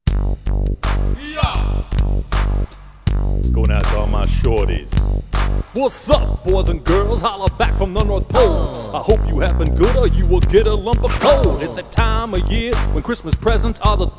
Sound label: santa rapping